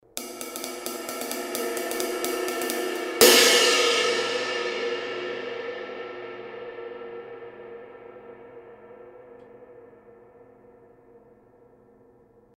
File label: Kerope, cymbal sound